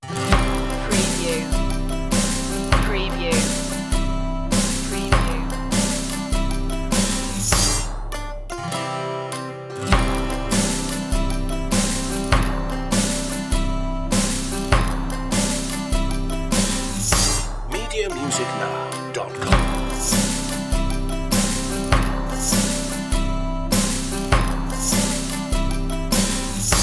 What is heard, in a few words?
instr version no sound fx